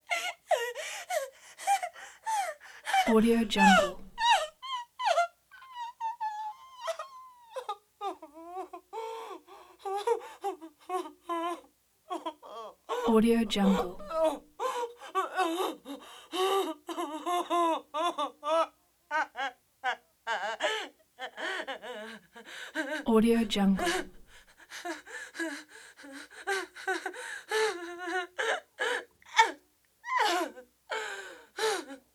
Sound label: screams and cries shrill screams; human sounds